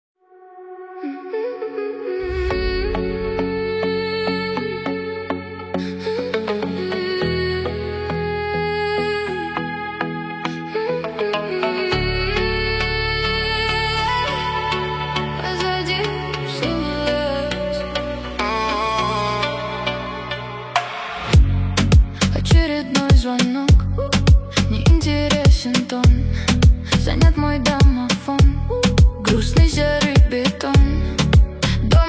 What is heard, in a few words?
emotional remix